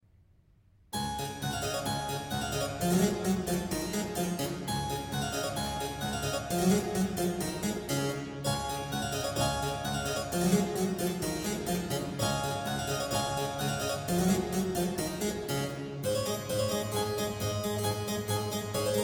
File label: musette d dur; bwv anh 126; clavier buechlein fuer anna magdalena bach 1725